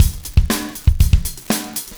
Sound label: 120 LOOP, 70's Breakbeats; USB Soundscan vol.46; AKAI